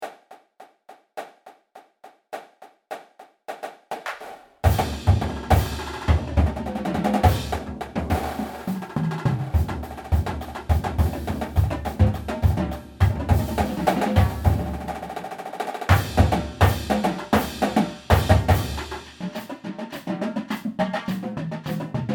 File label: Full Battery 104 BPM